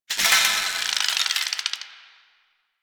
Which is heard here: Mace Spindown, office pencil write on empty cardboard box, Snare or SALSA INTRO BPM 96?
Mace Spindown